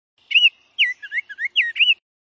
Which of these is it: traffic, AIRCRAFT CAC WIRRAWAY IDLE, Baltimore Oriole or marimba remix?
Baltimore Oriole